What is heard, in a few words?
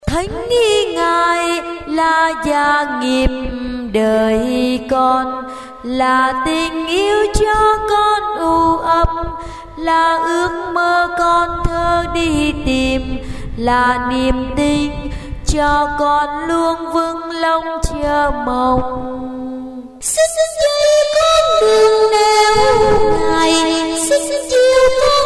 basso alto